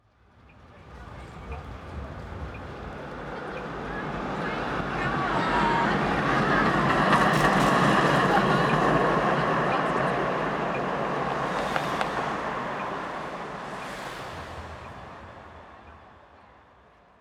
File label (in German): STRASSENBAHN